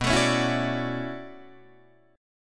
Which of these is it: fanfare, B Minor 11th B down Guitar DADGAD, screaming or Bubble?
B Minor 11th B down Guitar DADGAD